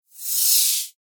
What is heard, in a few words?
Whoosh High Fast, Whoosh, High, Fast, Flange; Production Element, Imaging Element, Accent, Transition; BlastWave